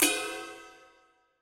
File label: Ride Bell; Uppercussion Conundrums